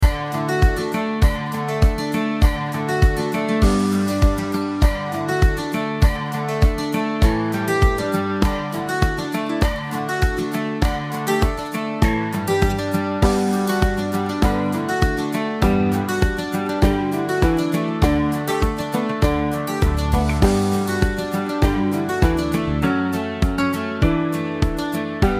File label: folk